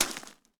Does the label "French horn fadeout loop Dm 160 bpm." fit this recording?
No